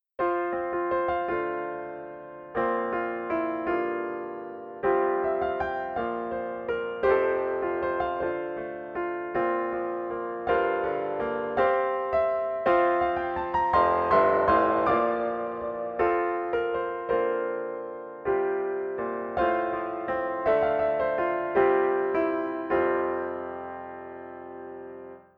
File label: solo; Praise to the Lord